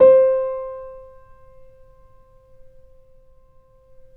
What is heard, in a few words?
Grand Piano PP C4; AKAI S6000 CD-ROM - Volume 2; Grand Piano 256M